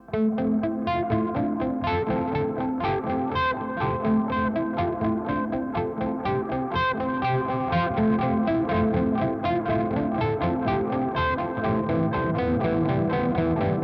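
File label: Avalanche Run V2 Gain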